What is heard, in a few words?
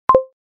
click result; kho bau